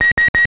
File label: Triple Beeps